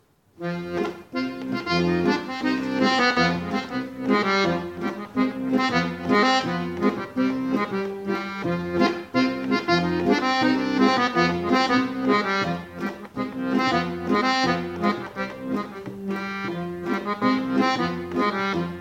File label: Gankino Horo